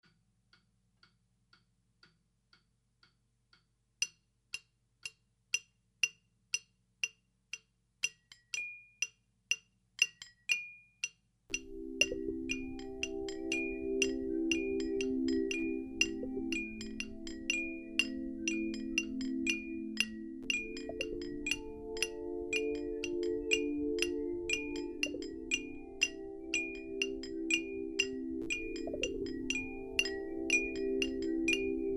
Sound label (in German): vase weinglas longdrink